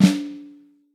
LT FLAM, Vintage Snare; Vintage Snare Drum; 12X15; Q Up Arts; Johnny.C's Vintage Snare Drum

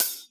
HH1 -R, Drums Acoustic; AKAI; 90s sample CD; USB Soundscan vol.10; RAW KIT 4

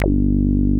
MOOG HI G1, ANALOG BASS; Music Store - Claudius Diemeter Schwerlos

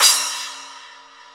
Cymbal Splash; Proteus 2000; Multisamples; Digital Sound Factory Proteus 2000

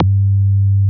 Moog G2, analog bass; Music Store - Claudius Diemeter Schwerlos

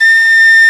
Penny Whistle A4; Multisamples; Digital Sound Factory Planet Earth

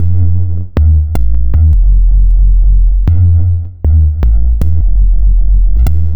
Synth Bass

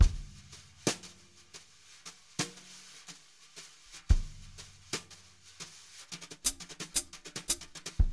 jazz brush loops

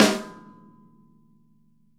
SNARES, Drum Kits

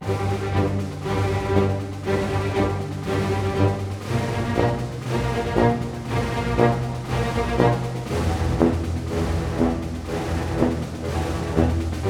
SNAR, Snare Army; East West - Scoring Tools

